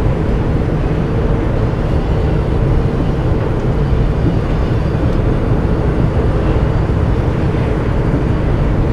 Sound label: Plant Exterior Amb Loop